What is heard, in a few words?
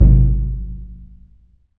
Surdo; E-MU Producer Series Vol. 2 – More Studio Essentials; Composer; Surdo Pallet